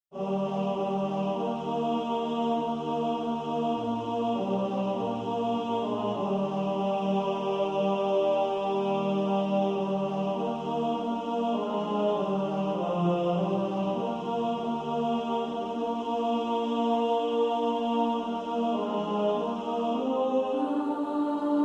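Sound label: Were you there ten, tenor, choir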